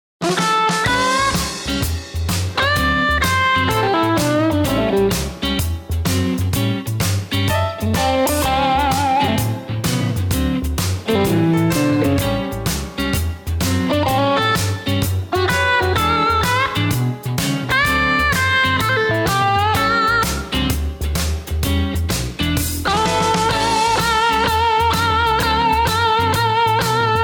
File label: blues rock